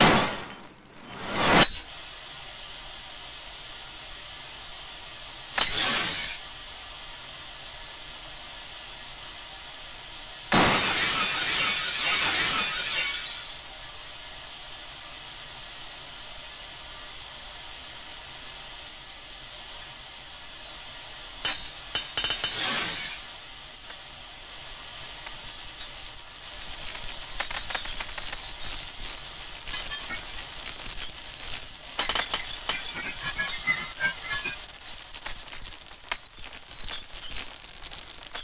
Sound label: electronic interlude